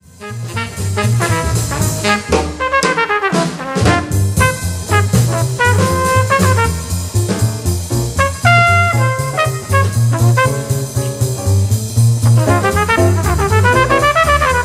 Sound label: trpt solo only, jazz trumpet